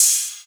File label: Open Hihat; Drum One Shots; Cymbals